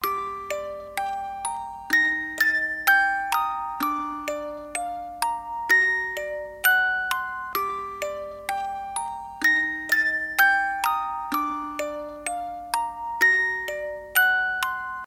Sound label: Magic music box